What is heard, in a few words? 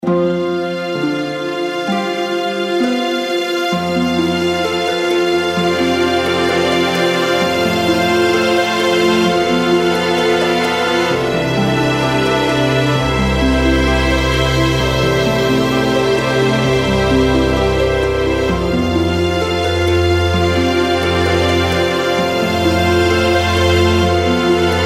calm lake; melodyloops